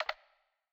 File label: double tap equip; UltimateFishing2020